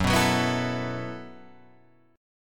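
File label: B 7th Suspended 4th G flat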